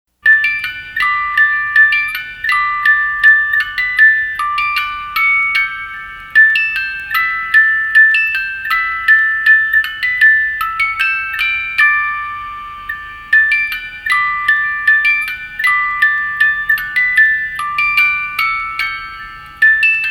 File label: Spooky Music Box; Halloween Sound Effects